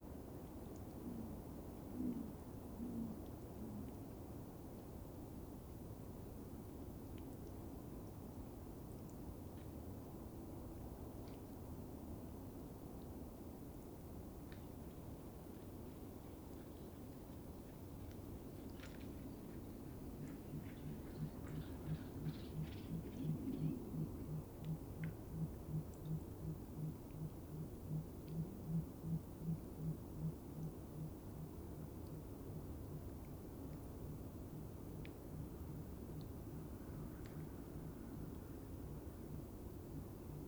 Lapland Owl Hooting Wingbeats Of Female Pulsed Hooting Of Male